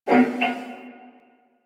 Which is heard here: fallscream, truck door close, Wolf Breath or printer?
Wolf Breath